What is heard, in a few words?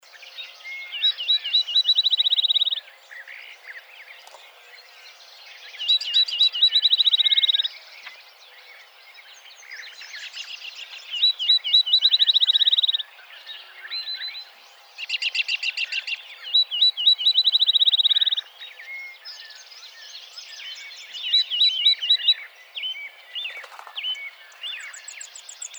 Field Sparrow